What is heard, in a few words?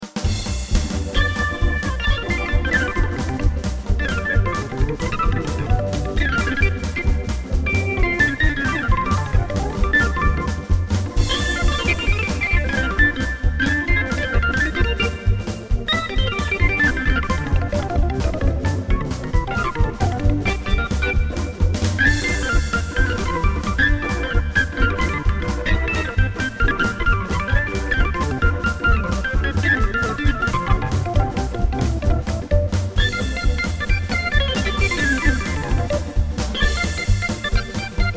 Hammond